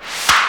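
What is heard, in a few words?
Snare Reverse, Fairlight CMI III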